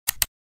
Double Snap; Alcatel Vodafone Smart II

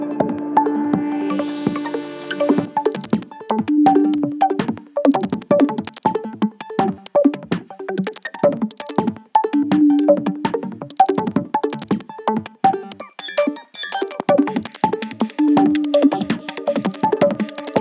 Dots, ringtones; Motorola Droid MAXX XT1080

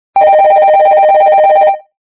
Melody, ringtones; Doro PhoneEasy-410gsm

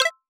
Dock; Google; Android-Open-Source-Project

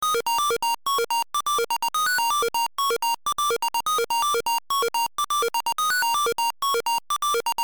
MONO bossa; Nokia; Monophonic

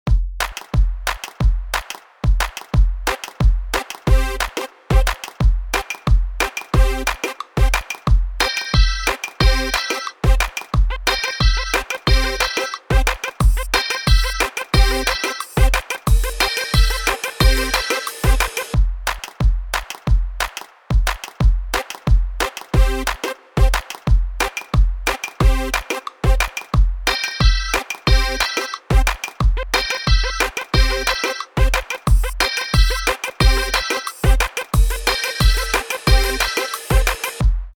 Everybody Ring; ZTE C76

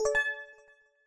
Error

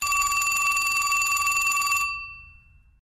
Ring Vintage Telephone; LG T300 Cookie Lite

